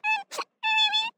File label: cry; ddnet; teefault